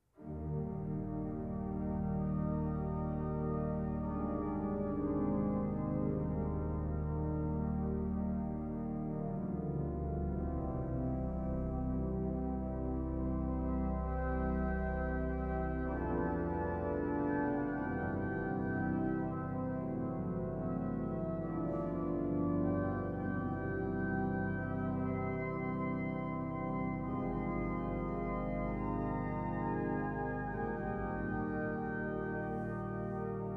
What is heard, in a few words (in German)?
Crescendo mit Glockenspiel Improvisiert an der Orgel; Improvisiert im März 2014 an der Orgel von Johannes Kyburz von 1810 in St